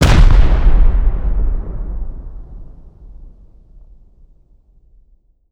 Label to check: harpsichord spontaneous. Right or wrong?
Wrong